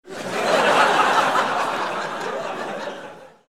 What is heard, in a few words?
laugh